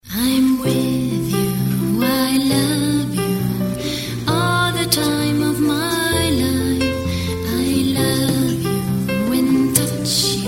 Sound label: lovesong, ringtone